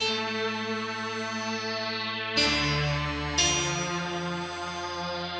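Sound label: piano, Hip Hop and R&B Drumloops; Best Service ProSamples vol.01; AKAI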